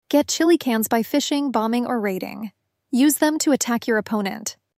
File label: slap